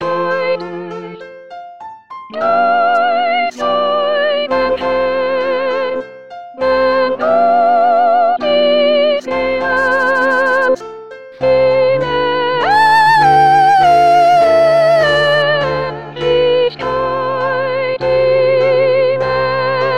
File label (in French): sop psaume